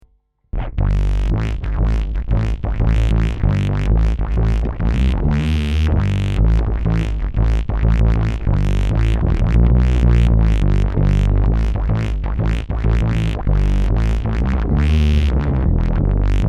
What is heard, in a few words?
Dirty synth